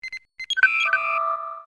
hailing frequencies open, computer

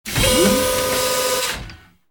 office doors

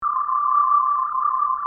tricorder

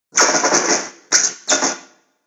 electric arc; TOS

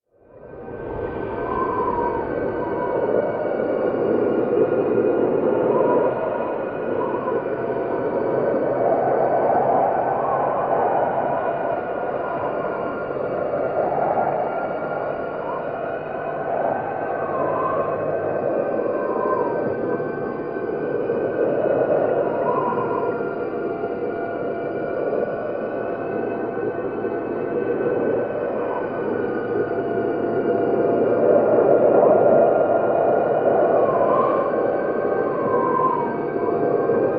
planet wind; background; TOS

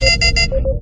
ambient bridge, computer

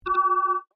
alert, computer

